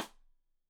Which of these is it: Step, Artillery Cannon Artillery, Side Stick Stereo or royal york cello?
Side Stick Stereo